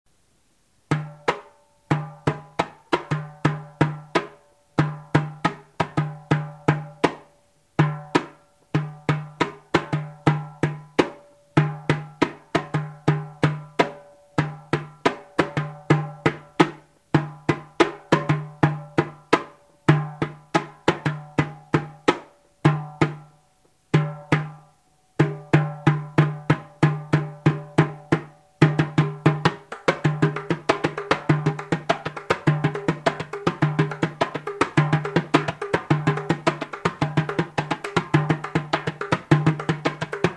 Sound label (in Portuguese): repique de mao solo